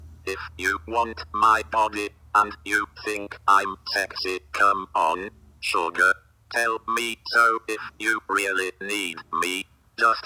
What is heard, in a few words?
Robot Sings